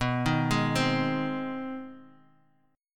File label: G sharp Major B; arpeggiated; JGuitar